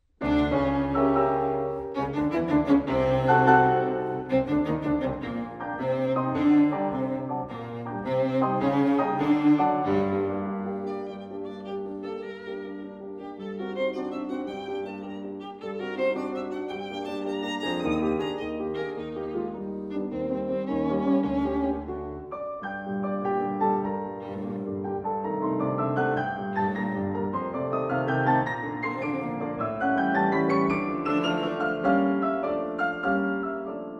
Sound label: piano trio; marschner